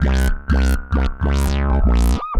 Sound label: Roland F Line, Roland S100